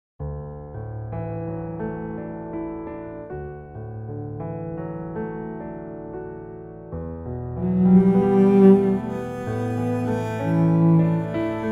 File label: cello